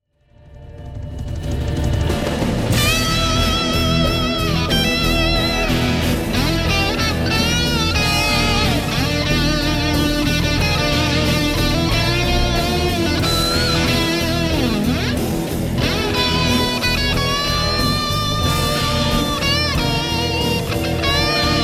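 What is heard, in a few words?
Solo Boss CS2, guitar; TDX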